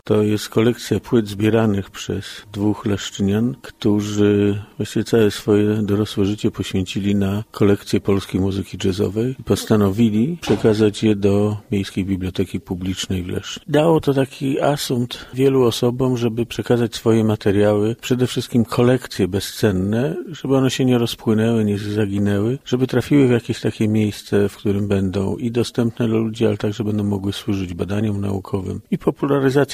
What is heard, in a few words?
jazz